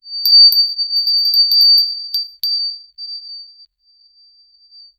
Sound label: fade in high pitch ring